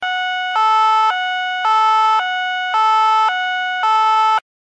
french police siren ringtone

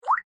water ringtone, Nokia SMS ringtones

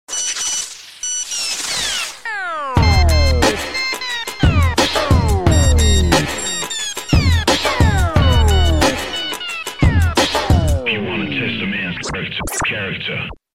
nokia sms ringtone

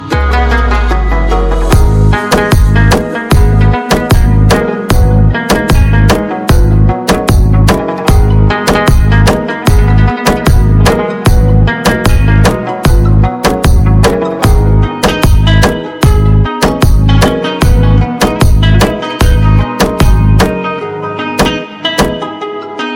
romantic instrumental song tone ringtone

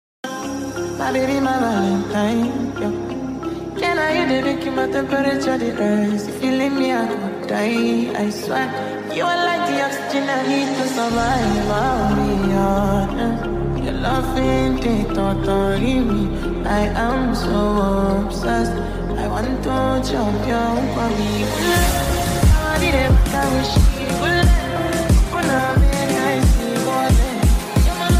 ringtone, english

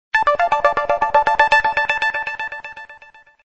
cool new sms ringtone

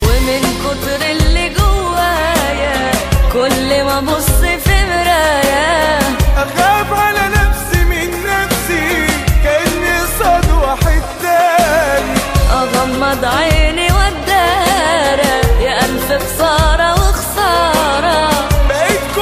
arabic ringtone

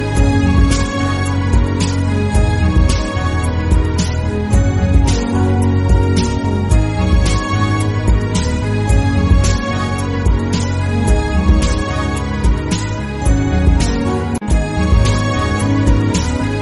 romantic music bollywood; bollywood ringtones

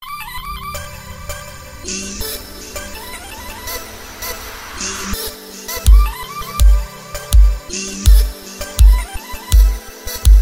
xiaomi redmi k40 pro ringtone

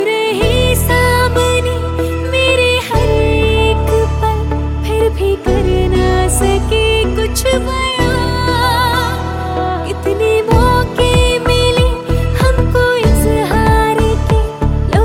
bollywood ringtone

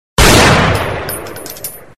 gun shot message ringtone

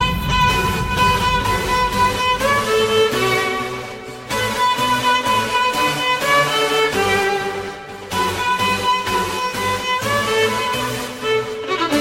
classic guitar English movie ringtones; nokia

